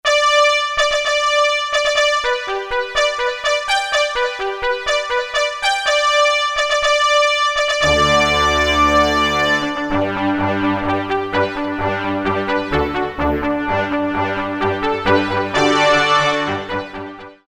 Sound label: FANFARE, Brass & Sax; Korg DSS-1